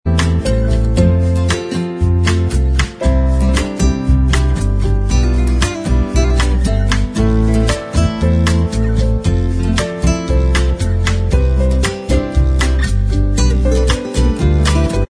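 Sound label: bossa mix instrumental Track, backing tracks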